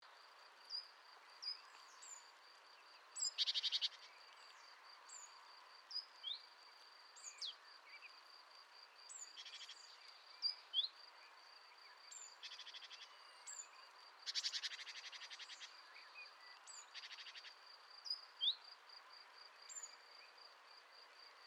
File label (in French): Mésange charbonnière